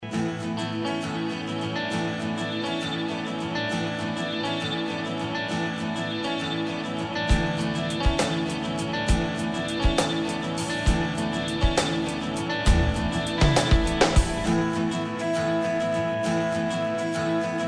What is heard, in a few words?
login bgm